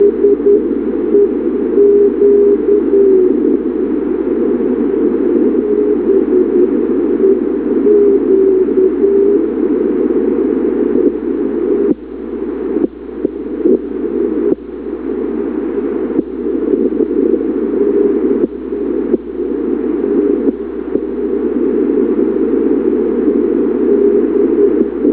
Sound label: ndb beq, NDB